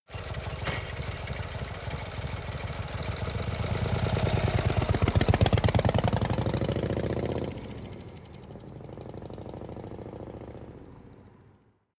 motorcycle harley drive set off

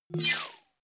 sci-fi menu close light; energie

